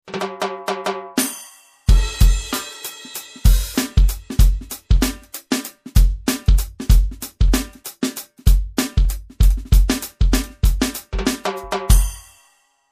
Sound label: Reggae Set